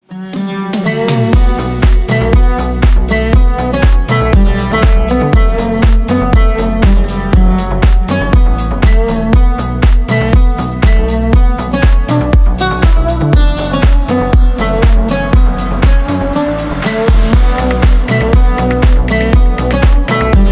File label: deep remix; dipkhaus na vkhodjashhij zvonok